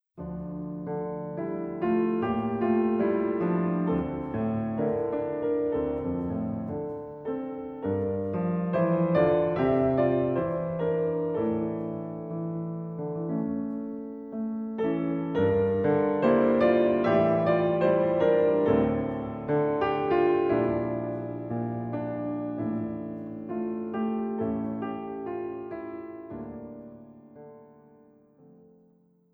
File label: Canción triste – Melancholic song